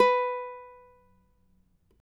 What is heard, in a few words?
CAVAQUINHO B, Guitars; Spectrasonics; Hans Zimmer Guitars VOL-2